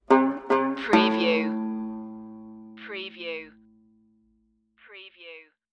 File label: banjo beat stinger